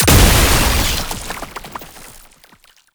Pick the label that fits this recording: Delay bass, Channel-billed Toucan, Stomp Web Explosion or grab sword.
Stomp Web Explosion